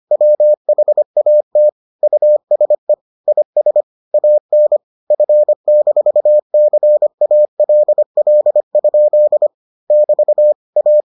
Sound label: morse